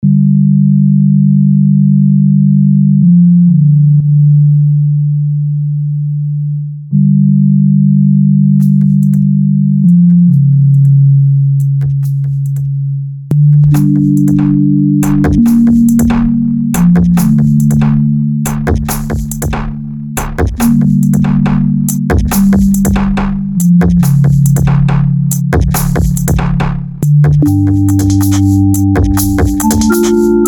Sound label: Electro Example